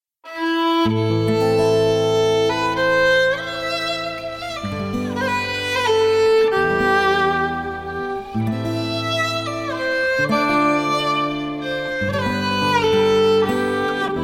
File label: Celtic heart